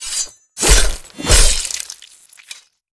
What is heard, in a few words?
kill